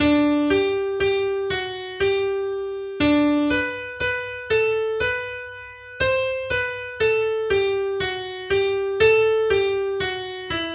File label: slow Mazurka Auvergne